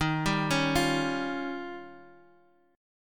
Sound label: D sharp 9th, Guitar, Open G; 13,12,11,10,x,x